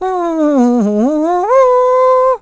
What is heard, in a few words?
Hum Secret; Postman humming the "Secret" tune (sometimes heard when presenting a letter)